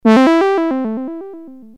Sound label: legato; nord